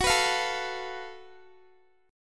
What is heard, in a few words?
F sharp Minor Major 7th Double Flat 5th, Guitar; strummed; x,21,21,22,21,x